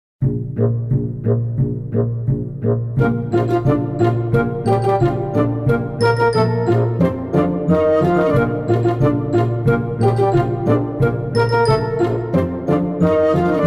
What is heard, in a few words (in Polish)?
instrumentalny